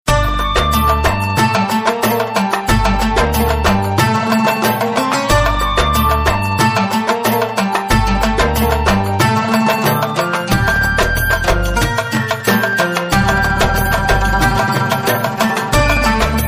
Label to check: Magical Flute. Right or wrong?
Right